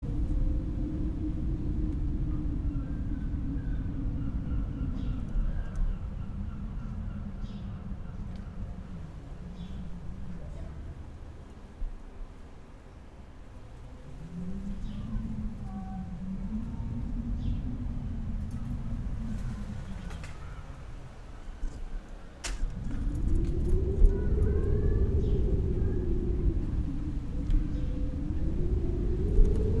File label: indoor